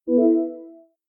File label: device added; stereo; kde; ocean